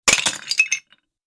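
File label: dishes breaking sound; tieng dap vo chen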